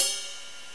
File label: normal hit whistle